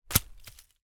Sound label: foley pine cone drop from tree onto forest floor